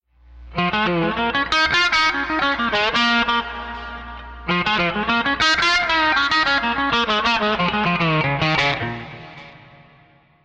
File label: Rock Run, pedal steel